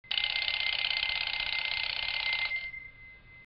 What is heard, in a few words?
old phone; PHYSICS